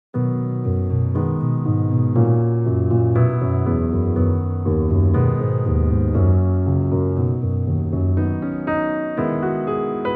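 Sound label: Piano Music, Sound Therapy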